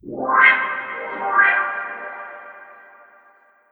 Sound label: ORCHFX, orchestra, Asian Traditions